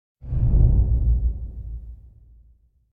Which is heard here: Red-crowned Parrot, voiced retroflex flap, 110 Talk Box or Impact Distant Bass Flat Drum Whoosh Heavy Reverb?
Impact Distant Bass Flat Drum Whoosh Heavy Reverb